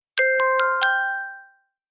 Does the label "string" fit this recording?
No